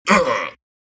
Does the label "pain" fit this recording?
Yes